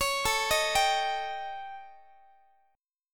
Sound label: A 7th Flat 5th Guitar; A7b5; arpeggiated